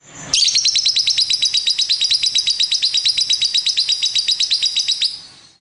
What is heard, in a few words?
lovebird